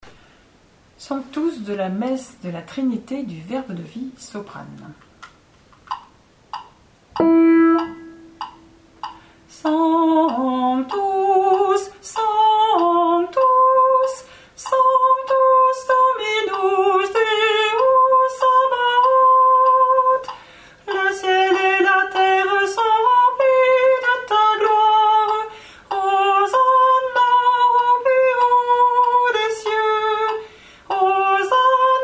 SANCTUS SOPRANE; Messe de la Trinité du V de V

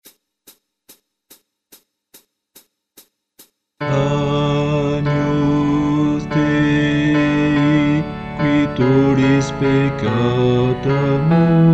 Messe de St Boniface Agnus Guide Chant Basse

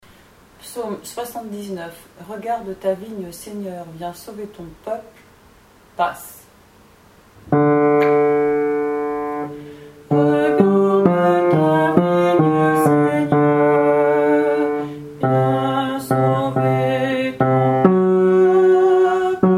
Basse; Ps 079 Regarde ta vigne Seigneur TPA Fonsalas

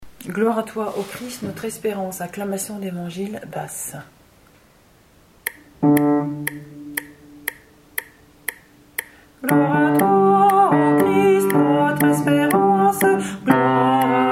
Acclamation évangile Gloire à toi ô Christ notre espérance BASSE

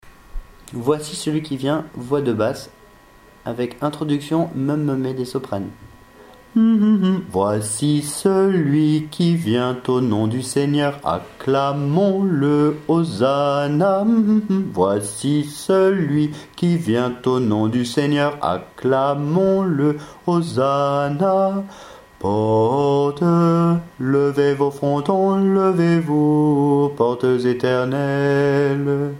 Basse; Voici Celui qui vient; chorale paroissiale; semaine sainte

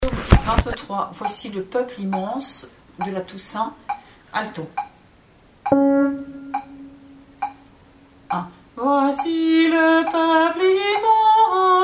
ALTO; PSAUME 23 TOUSSAINT Voici le peuple immense de ceux qui tont cherché

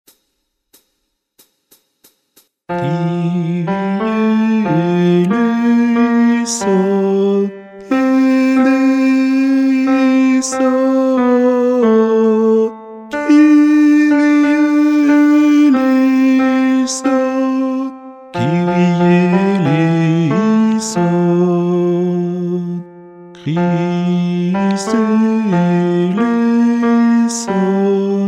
Messe de Saint Jean Kyrie Guide Chant Tenor

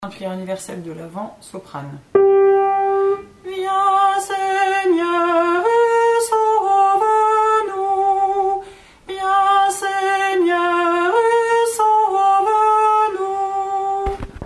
Refrain SOPRANE; de PU AVENT Viens Seigneur et sauve nous Bach

